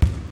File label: Ball Hit